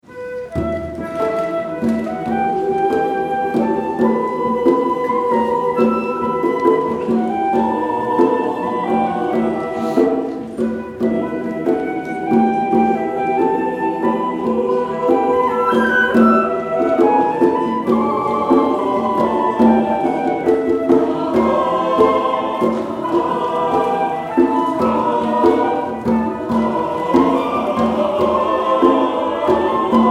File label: guatemalan santo flute